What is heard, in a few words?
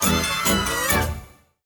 win Large; netent